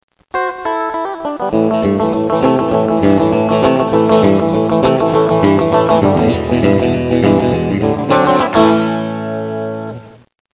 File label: rockabilly, guitars